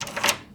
Door Lock Turn

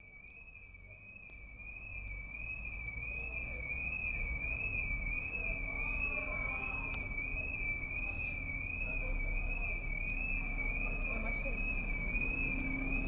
Shop Alarm System And Footsteps

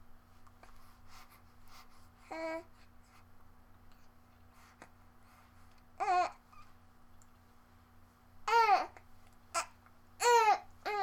Baby Noises 3 Month Old

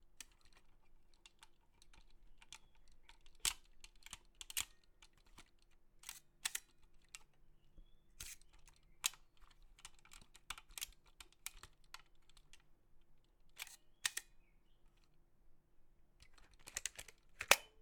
Film Camera

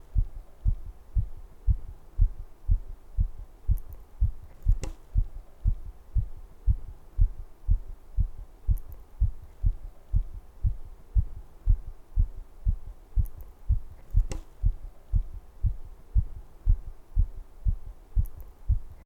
Heartbeats

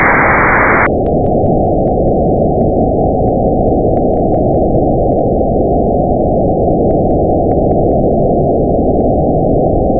Underwater

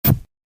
Tv Turn Off Static

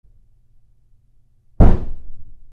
Dynomight explosion

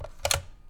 Phone Pick Up

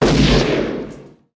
Pistol Gun Shot Mix

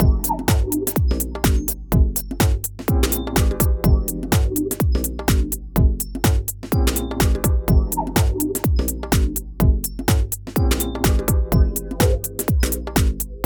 Deeper House

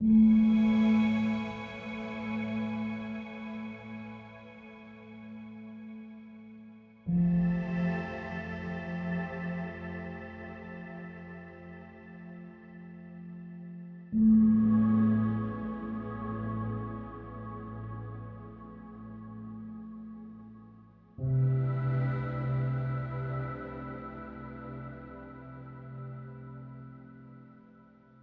Meditation

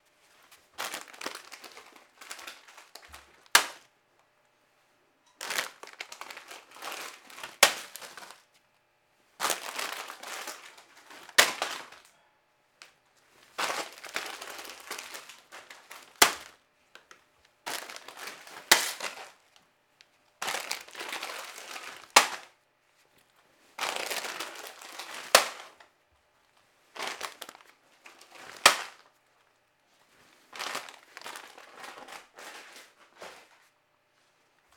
Crisp Packet Throws

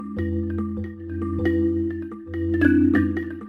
Bells

